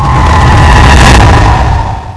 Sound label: meteor; quake2